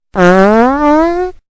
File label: fart